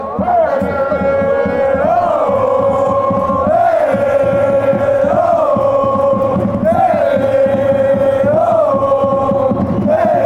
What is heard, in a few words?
heyay woaho batterie